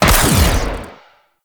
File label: Portal Beam Fire Alt; Enemies; Abilities; Warframe; Zariman; Void Angel